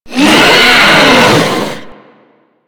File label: Grineer Hammer Boss Angry, Vocals; Warframe